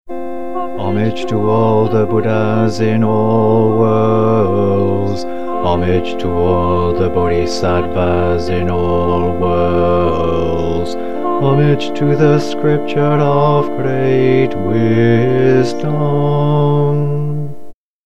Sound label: bass, harmony parts morning service; Three Homages